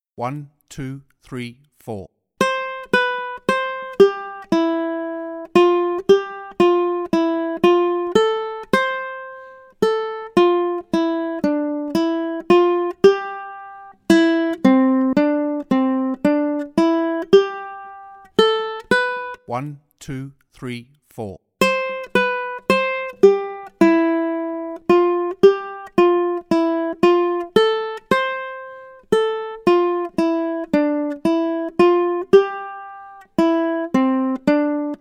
Accompaniment, ukulele

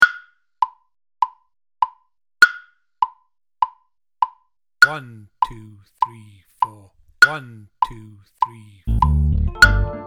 Amazonia Click, drum kit

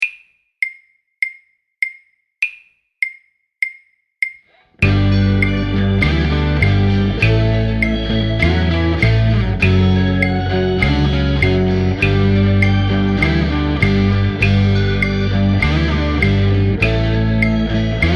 Half Time Groovin Practice Mix, drum kit

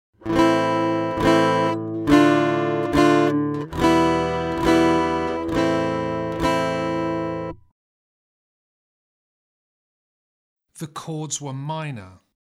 Aural Harmony Test, acoustic guitar; Grade 2

